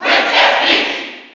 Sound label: Cheer; Peach; SSB4